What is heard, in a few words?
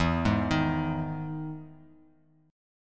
E Major 7th E down; EM7 arpeggiated; JGuitar